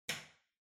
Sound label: Light Switch-Rocker (Far-Hall-Hard)